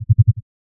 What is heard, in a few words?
bone; noteye; roguelike